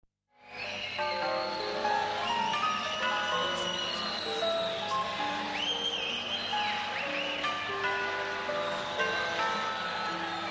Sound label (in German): Pfeifen